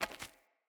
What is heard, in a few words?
Walk Stone, Footsteps; Characters; Mother